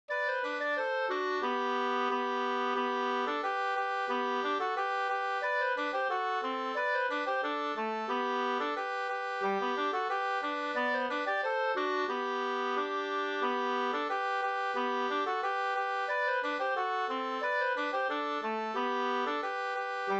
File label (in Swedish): folkdans; Dansk marknad 2st